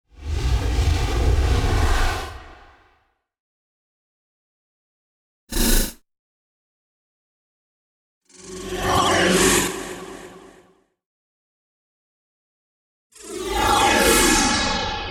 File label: Hollow Whooshes Heavy Wind